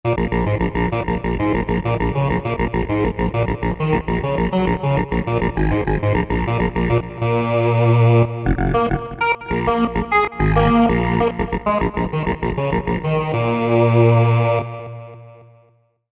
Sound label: Robot Vox, synth